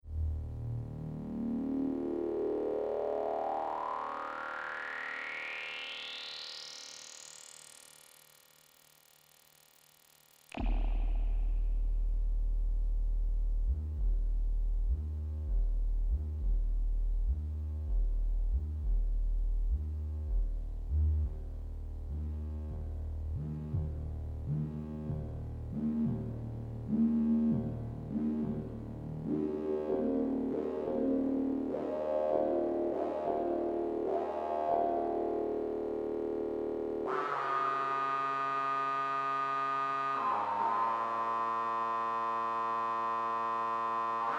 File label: yamaha cs5 solo